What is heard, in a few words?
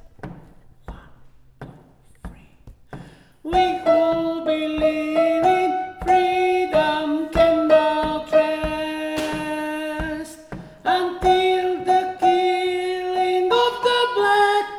Soprano